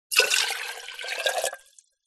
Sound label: household teapot ceramic pour tea into from cup; zapsplat